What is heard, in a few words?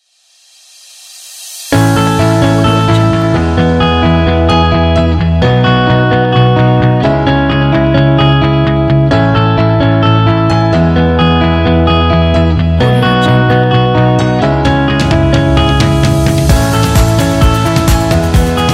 upbeat pop rock